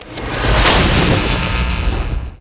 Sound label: enemy unique